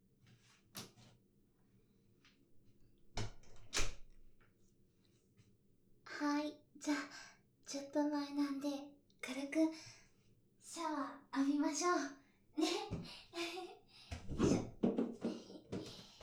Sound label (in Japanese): シャワー バイノーラル